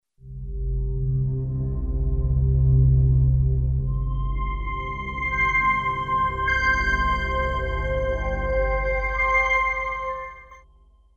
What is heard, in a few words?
deep jingle